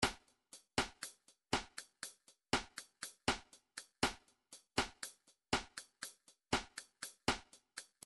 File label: midi drums bossa